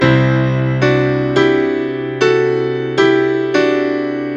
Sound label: Piano Ballad Thirds